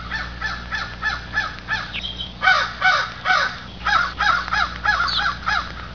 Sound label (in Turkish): karga, hayvanlar